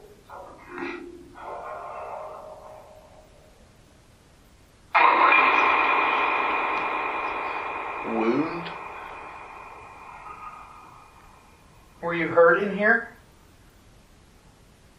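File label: 1st Floor Canopy Room Phasma Box; fairfield county infirmary investigation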